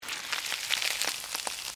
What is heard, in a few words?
Tear Rip Watermelon, Texture, Tear, Rip, Watermelon, Flesh, Fruit; Ripping; BlastWave